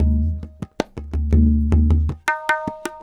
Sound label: punjab 80